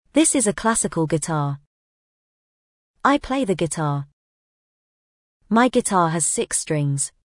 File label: guitar